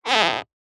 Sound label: foley leather or similar squeak creak short; zapsplat